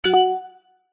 game tone synth bright organ style short stab alert